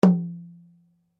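Drum Flat Skin forte, Drum heads isolated from drum shell; Musical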